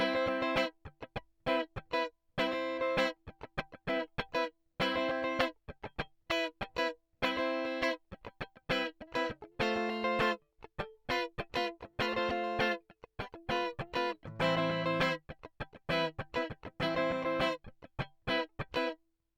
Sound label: Guitar Rythm Peak -10dB 250Hz